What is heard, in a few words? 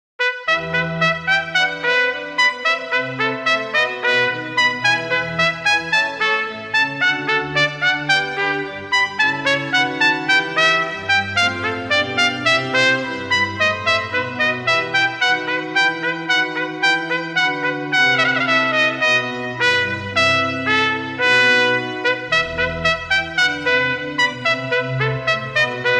Allemanda Sonata in E minor, Horn and Organ; Arcangelo Corelli